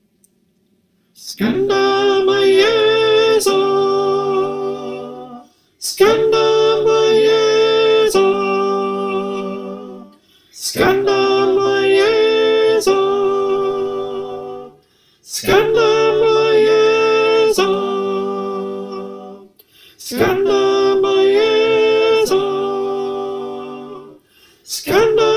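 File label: Soprano, choir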